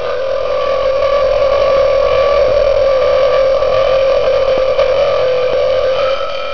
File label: long burp